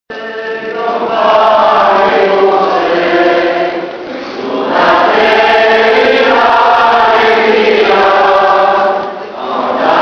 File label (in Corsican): sunate campane corse